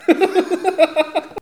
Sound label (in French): rire; khanat